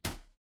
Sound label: impact slam